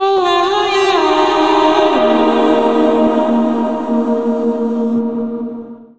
Vocal Shaman Choir

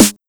Snare Dubstep TR909; pitchglitch

